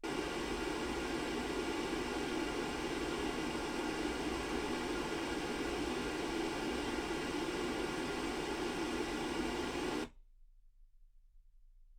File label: pink noise 48kHz